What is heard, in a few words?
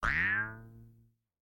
Twang Jews Harp